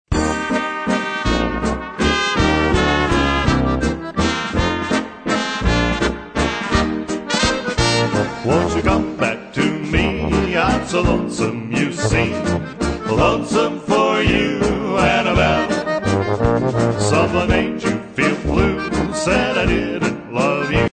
waltz

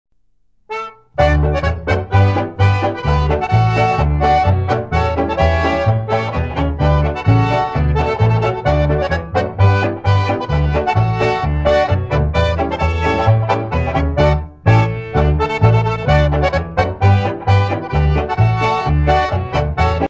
CLINKER POLKA

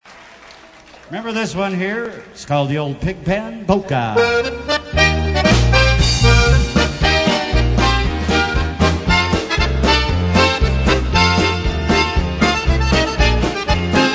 PIG PEN POLKA

